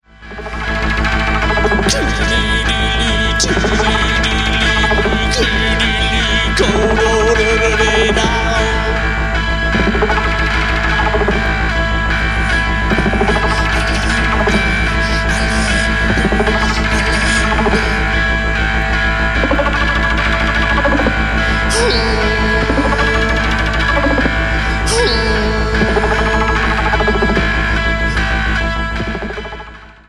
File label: Theremin; atelier Theremin